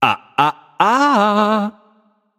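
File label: nonverbal disagree; voicepack; smite; merlin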